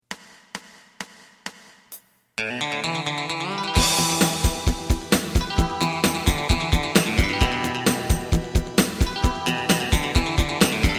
sona bass

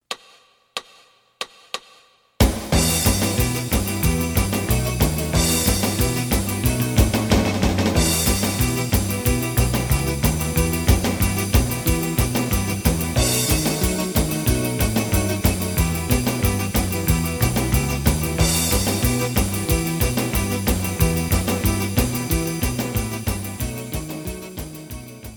lead

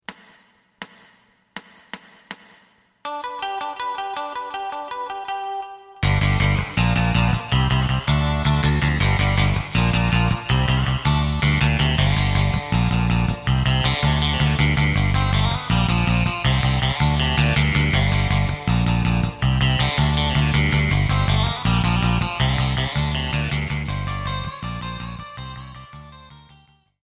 drum; エレキバンド用カラオケCD

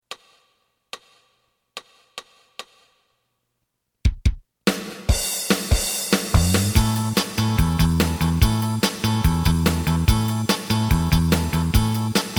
lead

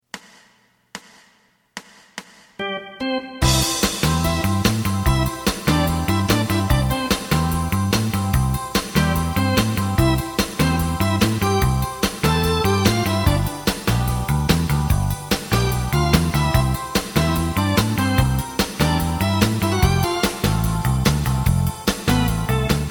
lead

